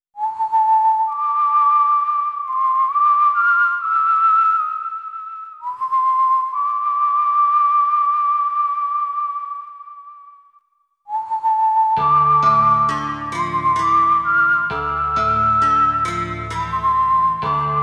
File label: WHISTLE; atelier iris eternal mana original soundtrack